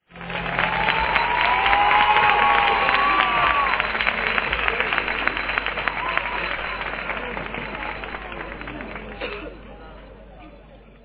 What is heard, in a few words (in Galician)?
aplausos